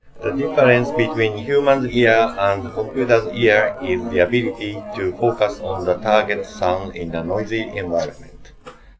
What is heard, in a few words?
trio